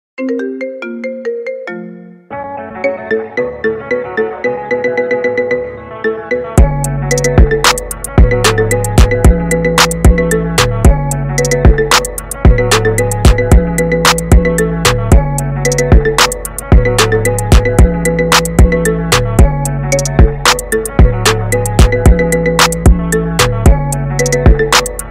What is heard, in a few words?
Marimba Remix